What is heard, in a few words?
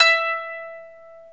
ETH KANUN, Eth Plucks, Saz & Kanun